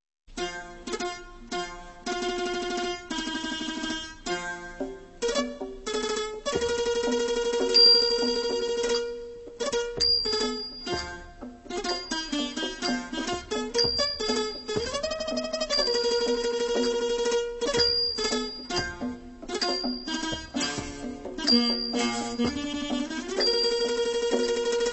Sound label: Classical Traditions; World Sounds